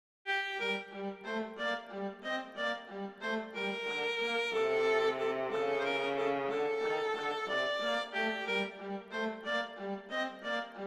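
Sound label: Andante non troppo lento, Duets Violin Viola; Mazas; Duet 6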